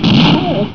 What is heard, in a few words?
Barney explodes